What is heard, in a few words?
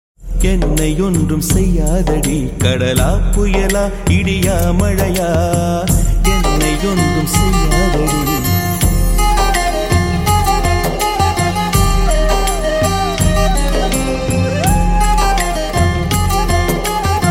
guitar cover guitar solo cover